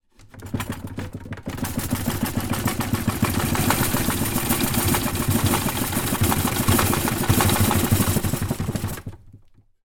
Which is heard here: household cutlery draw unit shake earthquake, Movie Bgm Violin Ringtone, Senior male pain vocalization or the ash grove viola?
household cutlery draw unit shake earthquake